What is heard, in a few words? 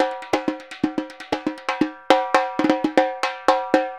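repinique, DRUMS SAMBA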